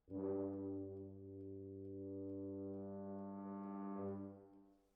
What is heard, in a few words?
Horns Over